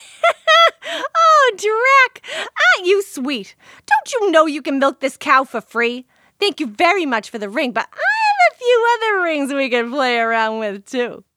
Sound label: "Hehe"; Romancelvania; PSElle